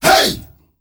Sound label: "hey", world voices, afro; ILIO; Vocal Planet VOL-5; AFRO NOISE